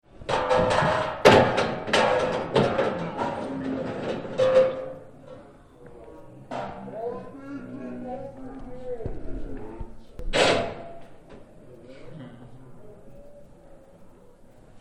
Fairground throwing soft balls at tin cans with crowd